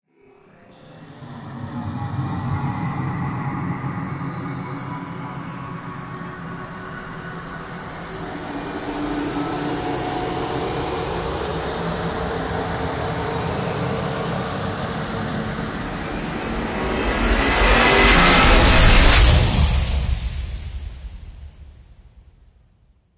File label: Risers Expander; Gothic Storm Music; Advanced Sound Design 2012